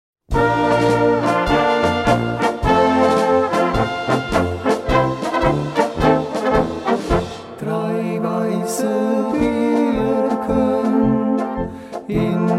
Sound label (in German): Polka, Blasmusik